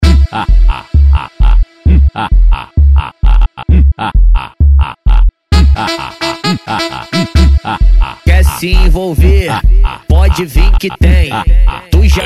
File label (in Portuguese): funk e sertanejo